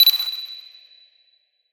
Camera, Beeps; SFX

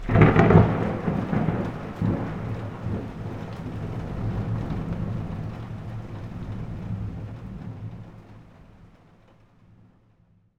Thunder

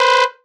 Scream Synth; SFX

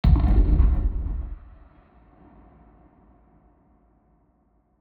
Water Steps; SFX

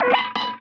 Glass Click; SFX

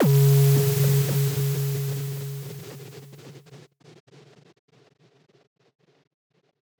Flute

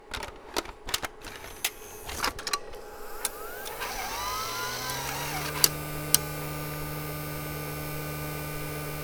Sony DVW Tape Insert; SFX; IRL Recorded

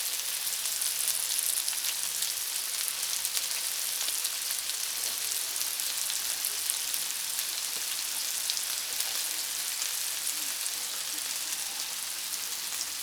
Food Sizzling; SFX; IRL Recorded

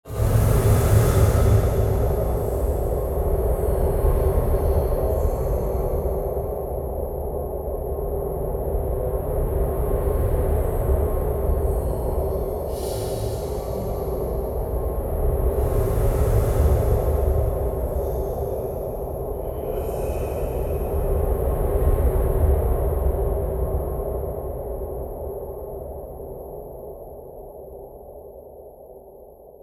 Underground Facility, Ambient

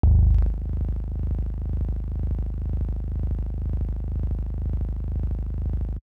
Broken Bass, Impacts Bass; SFX

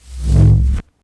Sub Impact; SFX; Experimental - Other

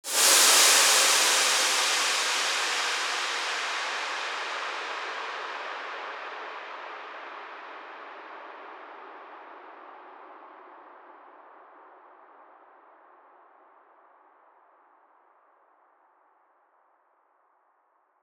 Downlifter; SFX; Downlifters

